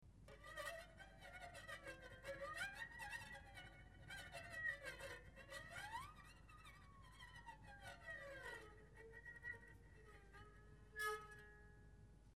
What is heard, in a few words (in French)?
moustiq, tricot